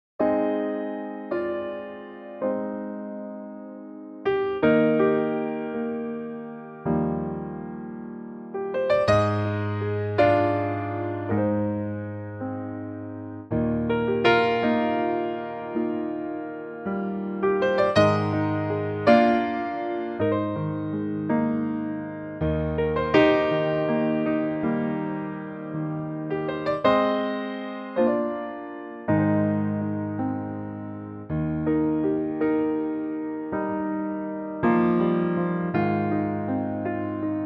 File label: Eb piano; key - Eb - vocal range - Eb to Ab; piano only arrangement